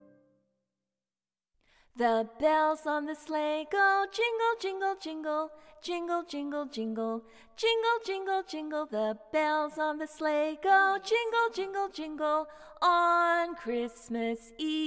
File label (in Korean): 영어 여 동요